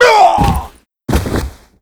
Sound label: Die